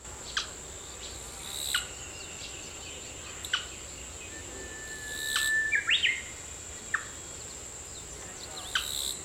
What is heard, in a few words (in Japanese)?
hikuina alarm